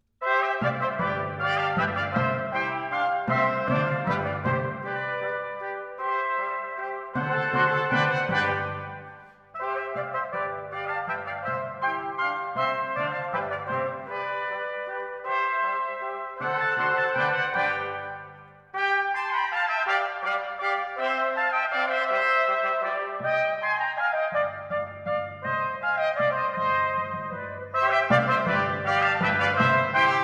Divertimento in C major, for 5 trumpets & 4 timpani - Menuetto; Mozart; Serenades-Divertimenti-Dances